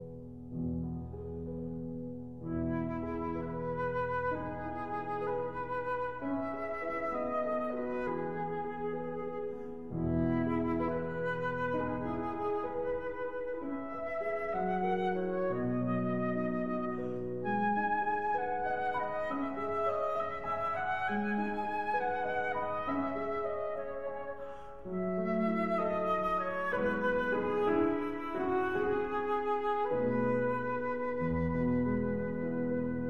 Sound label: Berceuse (Lullaby) for flute and piano